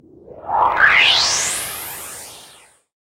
FX Dance

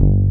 SOFT BASSS, DANCE

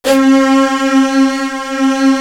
JD STR, STRGS

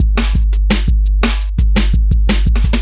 Beat, Drum N Bass

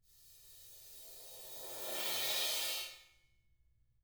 RIDE SWELL

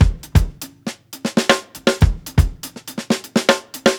120 BROKEN BEAT, DRUMS LOOPS; BASEMENT DRUMS VOL 2

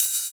Ride; Equipped Music; Loungin House

